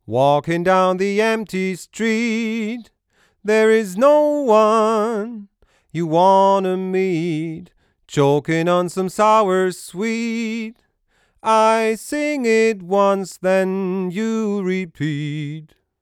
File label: Vocal 30 dB 300 Ohm; IGS Audio NE573